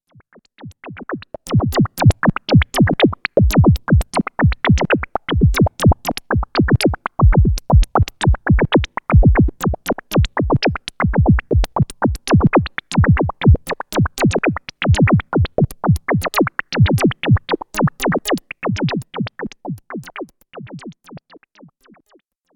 jx8p attack time

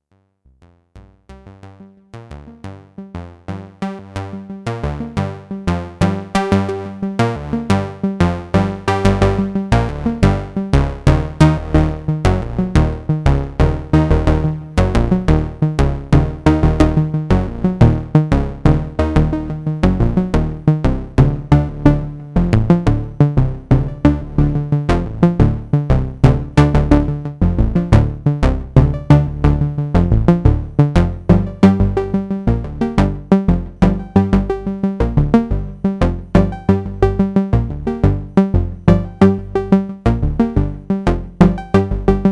Arpeggio, synthesizer; GRP A4

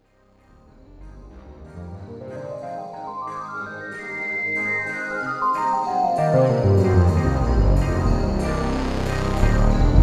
SUPER6 Unsquare Solo

